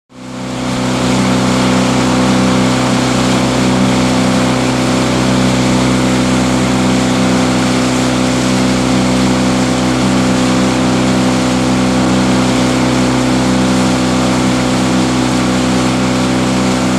Boat with Twin Engine; Run ( Ext. ); Twin Engine Motor Boat. Nice Spray And Wash From Bow.